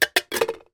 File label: food can soup empty drop on surface